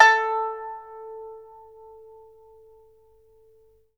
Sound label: PIPA A5, Heart of Asia; Spectrasonics; PIPA TREMOLO